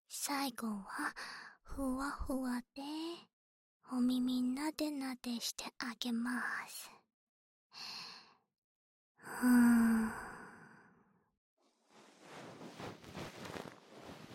【左】梵天, 耳かき; 耳かき小分けトラック

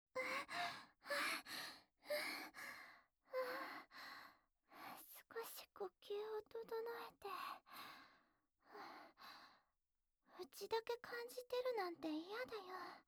耳舐め、フェラ; ウチのおまんこ気持ちいい？感じる？

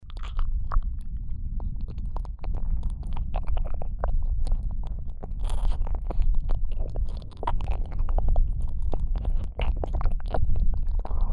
両耳舐めループ; ASMR; 耳塞ぎあり

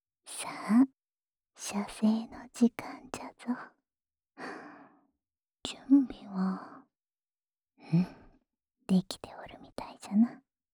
オナサポ用浅い耳舐め（右耳）, ASMR

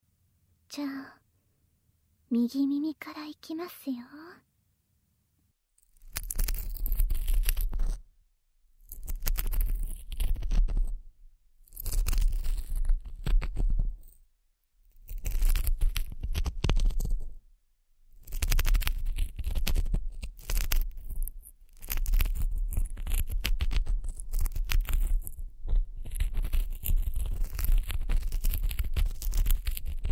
両耳かき→両耳ローションマッサージをしてもらえる安眠音声; ASMR; 30分間; 片耳、両耳ver.

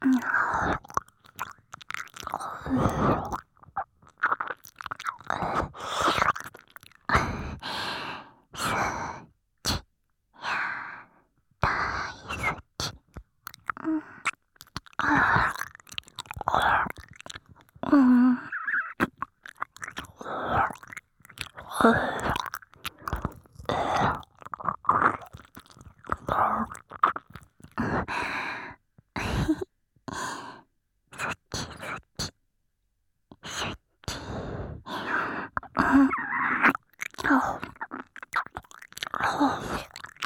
しゅきしゅき耳舐め; ASMR; えろまま

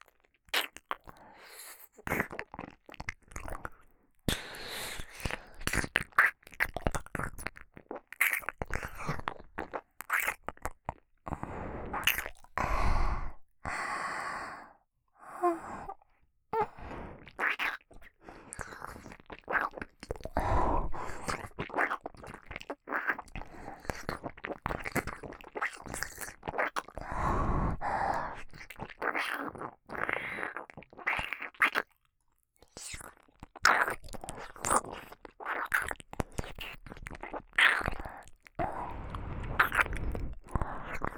耳舐め オンリー, ASMR

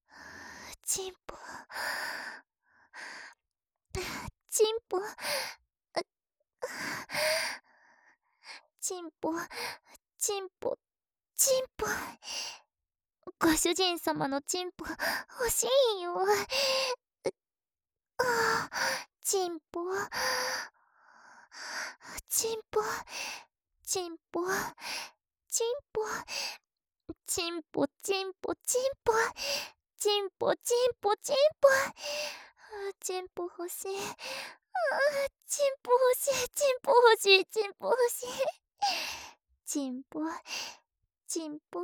喘ぎ; ちんぽ

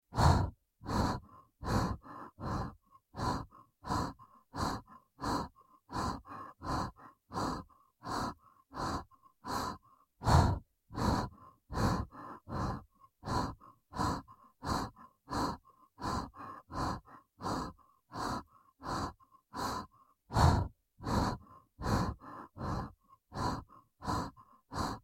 ハッハッ ゆっくり

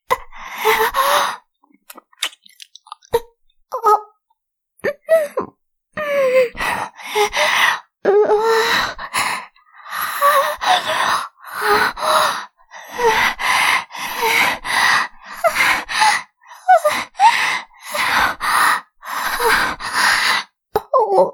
くちびる合わせ, キス, ASMR

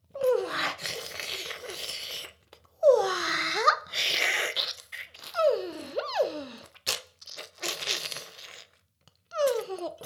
フェラ音声; 魔法少女マスカリマッスル

